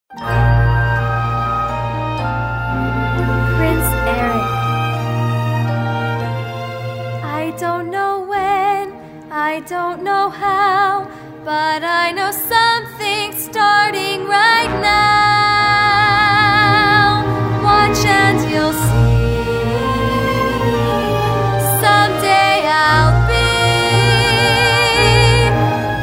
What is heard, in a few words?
vocals